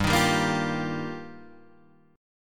G Minor 7th Sharp 5th

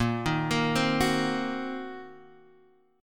Eb Minor 6th Bb, guitar chord

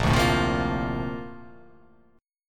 Bb Major 9th, Bb, down; strummed; JGuitar

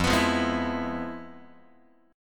F-Minor Major 7th Flat 5th, Guitar; FmM7b5 strummed; JGuitar

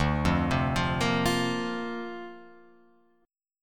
D-Minor 7th Sharp 5th D Guitar Open F, chord; Dm7#5; arpeggiated

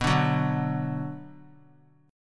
B-Minor; strummed; JGuitar

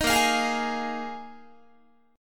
A sharp 7th Suspended 4th, Guitar; strummed; chordshape; 18,18,20,18,21,19

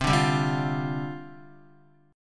C Suspended 2nd Suspended 4th, down, Guitar

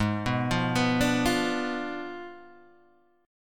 G sharp Diminished 7th G sharp, Guitar Open G

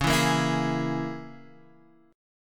Db Minor 6th, Dbm6 chord; Dbm6 strummed; x,x,x,6,4,5,6,5; JGuitar

